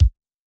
Hiphop kick; Reverb+EQ+Comp+Limit

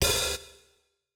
Rock open hihat; Reference Beat

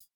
no effect j city pop 1980 hihat

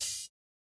no effect j city pop 1980 open hihat

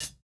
hihat, house

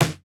snare, snare house

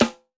snare samples, Rock

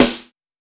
Hiphop snare

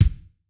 kick; Reference Beat BPM-130; Pophits

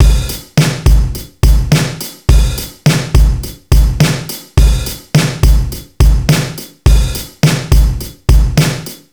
Rock loop; Reference Beat

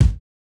kick, house

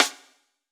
hiphop snare, Effect on

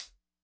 hihat, Acoustic

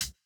Jrock hihat

